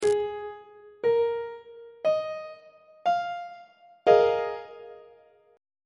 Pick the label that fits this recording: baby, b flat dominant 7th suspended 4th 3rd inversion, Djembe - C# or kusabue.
b flat dominant 7th suspended 4th 3rd inversion